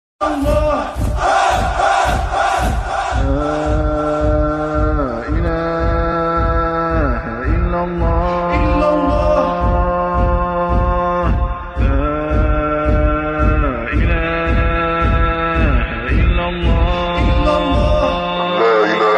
la ilaha illallah ringtone islamic ringtone arabic ringtone; muslim ringtone